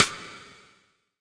Aro, drums reverb; MARKYDRUMS

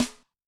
Snare, Yamaha DTXpress-II

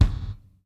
GATE, KICK DRUM; Sampleheads; New York City Drumworks VOL-1

